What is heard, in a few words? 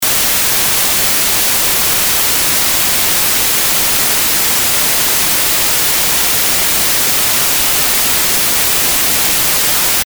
stereo mono white noise 48; Android; CtsVerifier